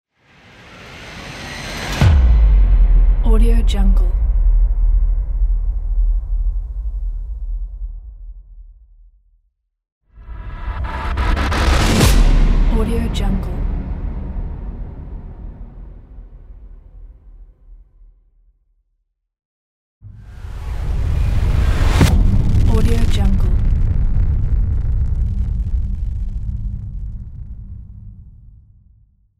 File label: cinematic whoosh hits